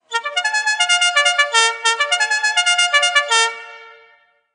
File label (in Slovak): fanfara 4 tonova 12v; stebel mp4 music call to the post